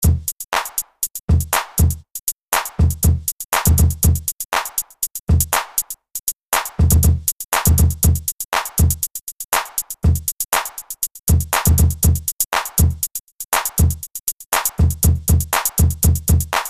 Electro Lash Drums

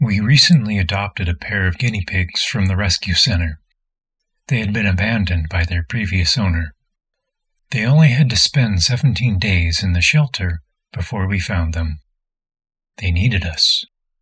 vocal

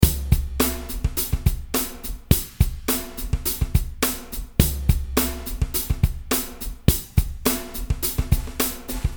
beat

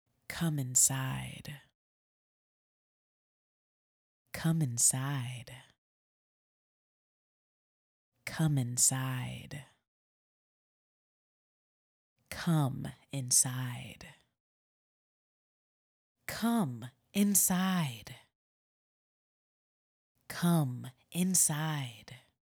Come Inside (Backup Vocals)

